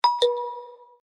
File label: Fade In, MIUI 10 Stock Notification, Slowed; зарубежные, без слов, короткие, стандартные, xiaomi, на уведомление, на смс